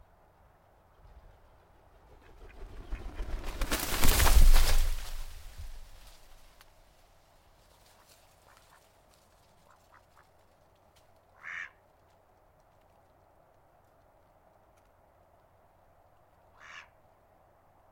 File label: duck landing